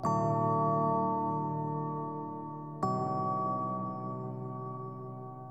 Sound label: vibe